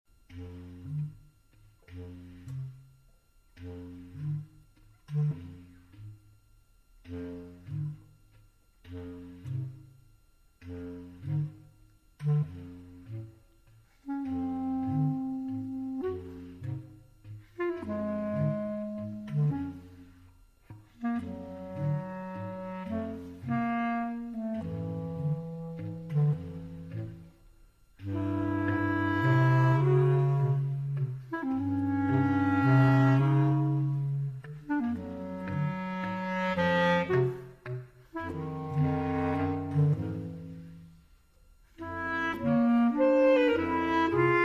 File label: Clarinet Trio Walzer